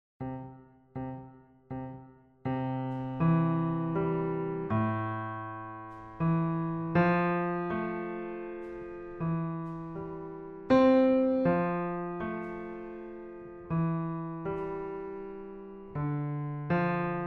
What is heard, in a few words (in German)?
einfach LH T80; Filmmusik